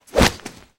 Heavy Cloth Snaps

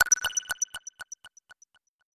Digital Text Data Drop, stinger

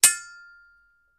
Sword Clangs Sword, swords

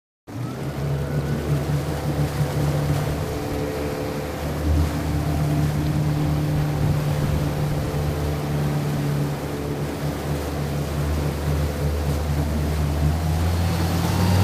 Fishing 350 Chevy Mo

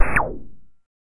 Video Game Fire Effe

